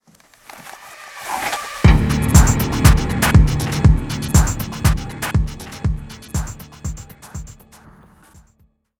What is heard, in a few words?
Sounds Cinematics, Sniper Introduction